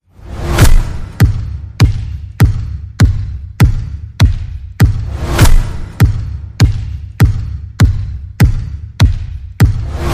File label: Dribbling Queens Pulse